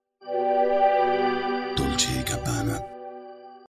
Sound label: Powerdown; Motorola RAZR-V3i D&G